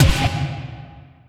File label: Trap Oneshot